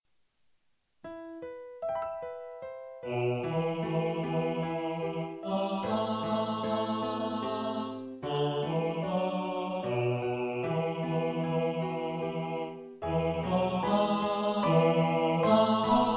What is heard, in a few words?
bass, choir